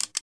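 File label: Double Click Generic, switches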